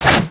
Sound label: Sword Slash; aronwk collection; ndaudio